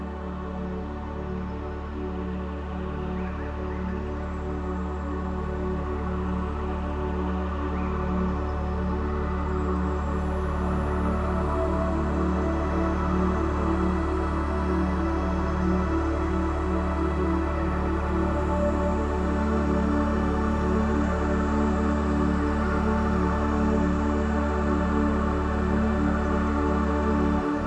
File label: 528 HZ